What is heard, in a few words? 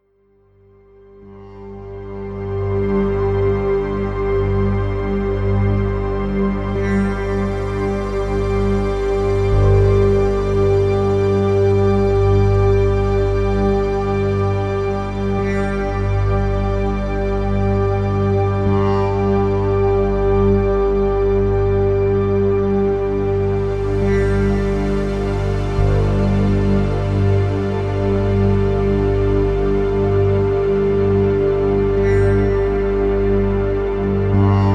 396 Hz Solfeggio Frequency